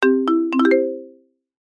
multimedia alert notification musical short marimba completed finished success; zapsplat